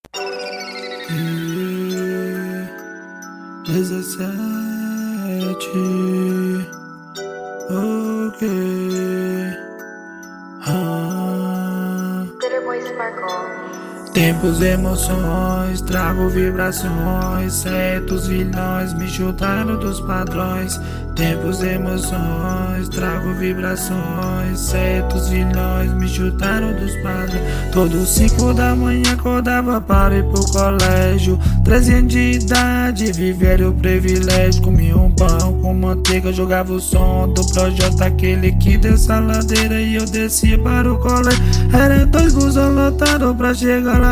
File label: DialetoRap